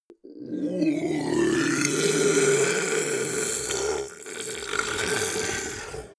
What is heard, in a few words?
ice golem melt groan start; koerpergeraeusche; comedy-cartoon; soundpool